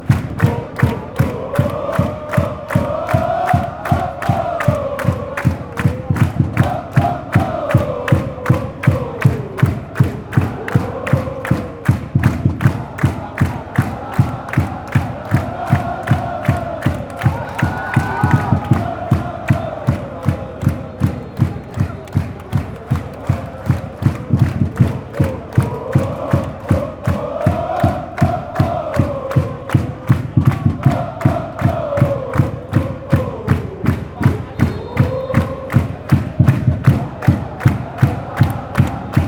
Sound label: ohoh, fanchants